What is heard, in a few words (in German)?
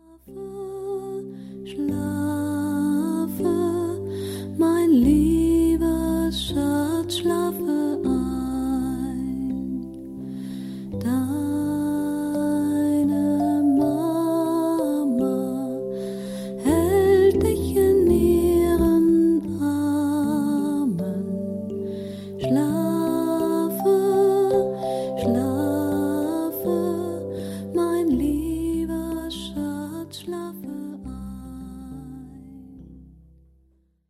Schlaflied